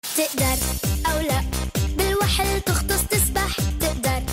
arabic song ringtone

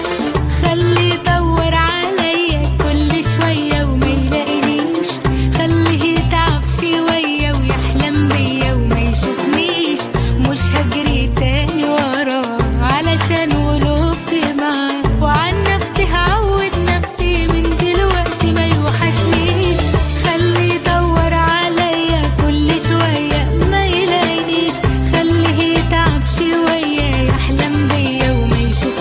arabic tone

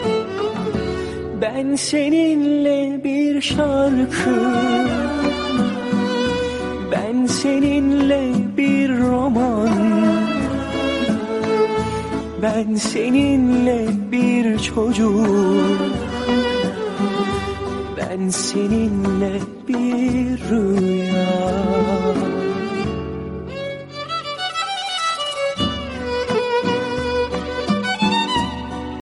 turkish love song

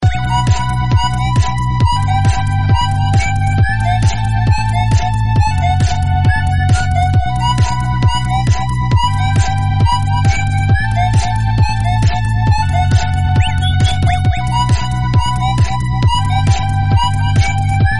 russian remix

